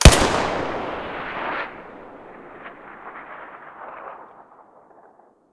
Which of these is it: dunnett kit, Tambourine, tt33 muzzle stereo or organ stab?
tt33 muzzle stereo